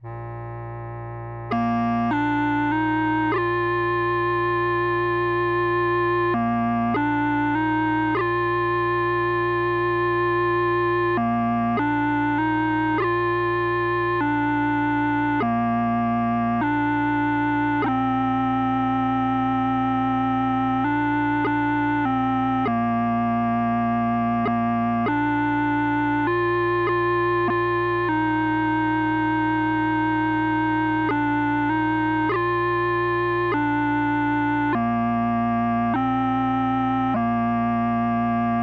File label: When the Saints Go Marchin In, pipes, 50 bpm; 4/4